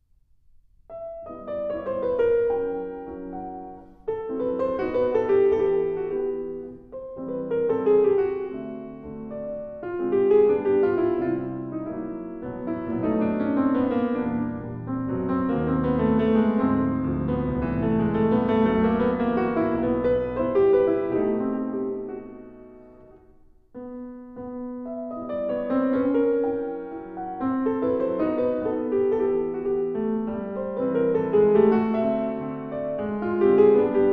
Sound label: mazurka